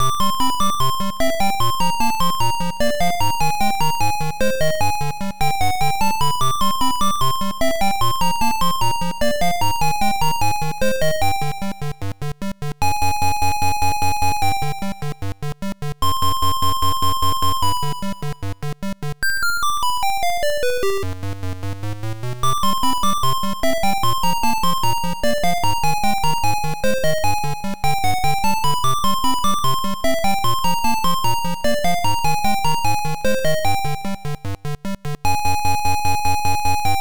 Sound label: Limestone Cave BGM; Dragon Buster; MSX2